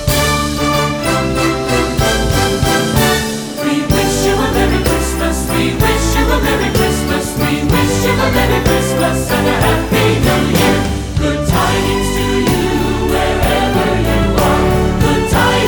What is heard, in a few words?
Jingle Bell Medley We Wish You A Merry Christmas vocals